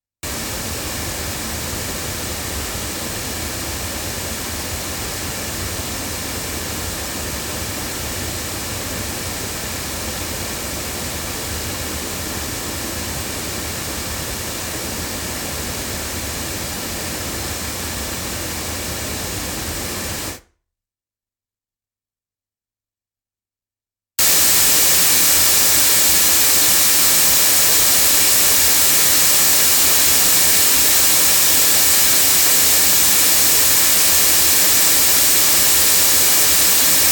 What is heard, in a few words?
loudspeakers; JJLabs C6000 Pro; microphones